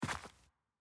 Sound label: Footstep Grass